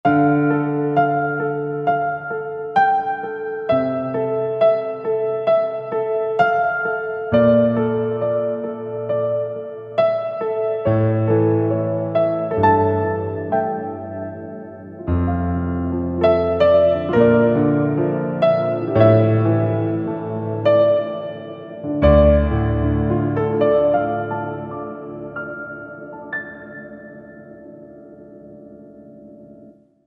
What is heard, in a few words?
Sad Piano